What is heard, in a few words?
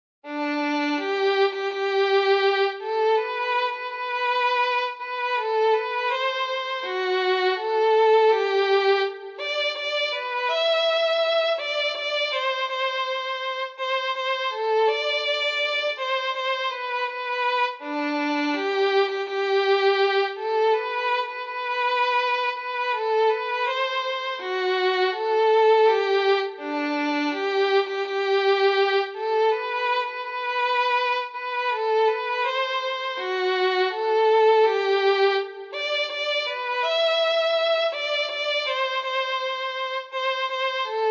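solo O Tannenbaum violin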